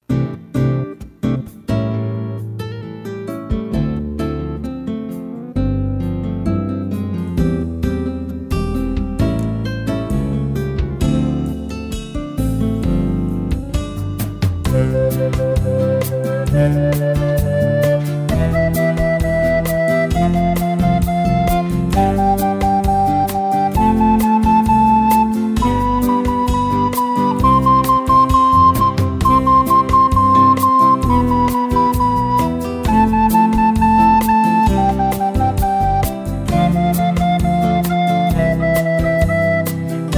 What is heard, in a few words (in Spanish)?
CANCION DE LA ESCALA PISTA